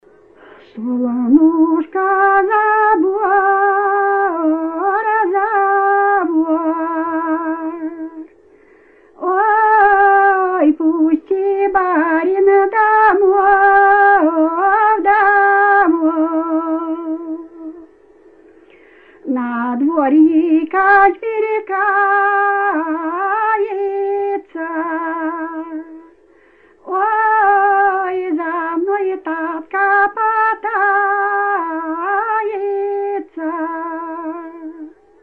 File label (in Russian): Жнивные песни Невельского района; д. Худяково; ОНКН; "Сол(ы)нушко за бор(ы), за бор"